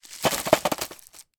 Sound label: food chocolates in wrappers drop onto more in tin; sound effects one